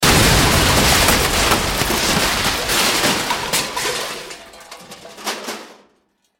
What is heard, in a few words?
Explosion crash debris large; BLASTWAVEFX